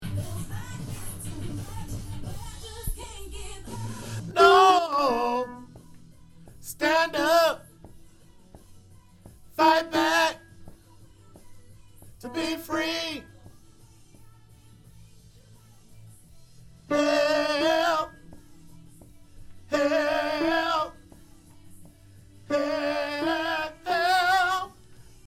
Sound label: Alto